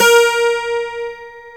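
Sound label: A#1, MINI 12 GUITAR; AKAI S-Series CD-ROM Sound Library VOL-7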